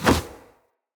Punch Whoosh, Unarmed; Weapons; Player

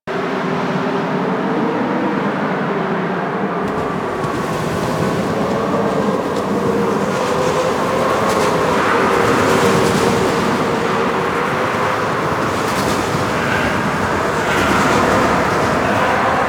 Cold Wind Amb; Warframe; Ambience; Corpus; Crp Exterior

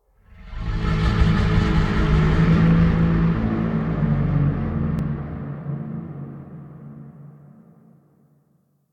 Moan Exterior, Ambience

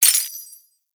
Step Spur; Enemies; Foley; Footsteps; Warframe; Duviri; Dax

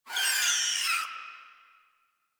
Forest Rodent Vocal Death; Characters; Warframe

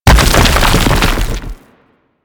Thumper Bot Thumper Impact Frack Rumble D; Warframe; Grineer

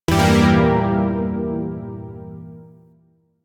Phrygian Chord C, Zariman Shawzin; Music; Warframe

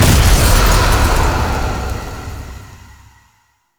Big Land; Uriel; Tau; Tau Circles Of Hell

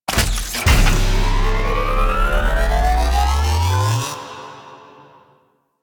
Rescue Gate Activate; Warframe; Fortuna; Venus

